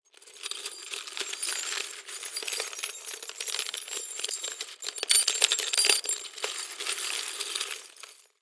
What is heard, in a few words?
Nails